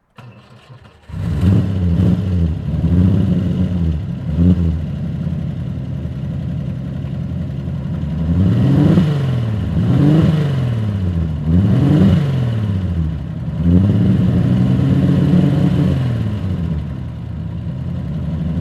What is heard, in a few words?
Chenard Walcker 1924